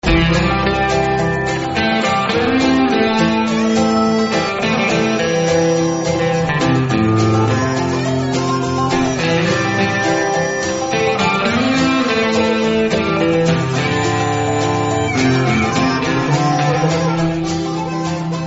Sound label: instrumental; trucks